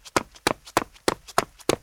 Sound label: Run; Mars Sortie